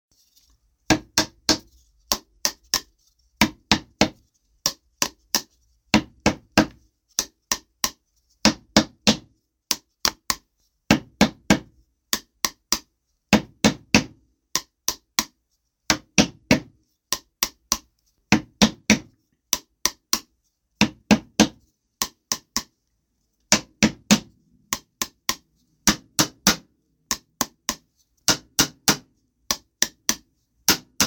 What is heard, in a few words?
bamp bamp bamp tick tick tick; insult to music